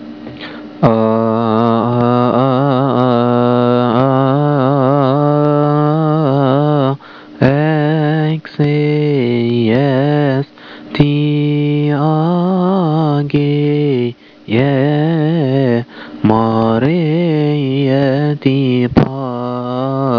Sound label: Chant; Coptic; Shere Maria (The Praise of Mary); The Coptic Network Sound Clips Archive